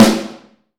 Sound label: CAH, vintage snare drum; Q Up Arts; Johnny.C's Vintage Snare Drum